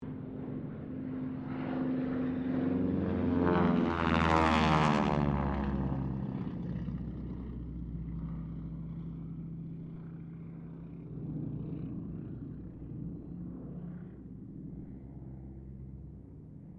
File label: Harvard squadron 4 pass, stereo